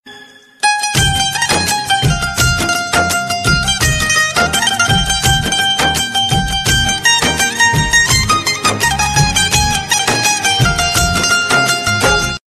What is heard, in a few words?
Indian Romantic Ringtone